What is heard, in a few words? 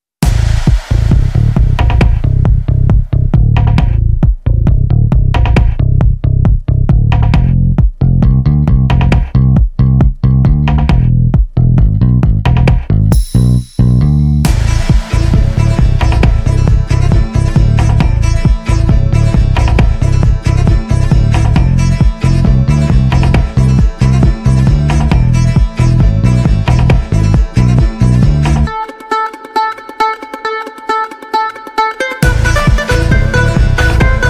Wedding Party Background Score